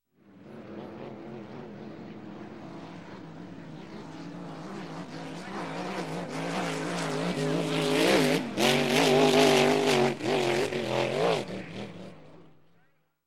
Boat, Race Single Boat Races By, Marine; Sound Ideas; Series 2000

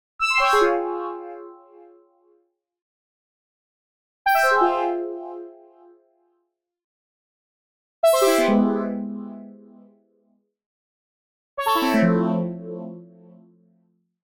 Arcade Jingle Negative

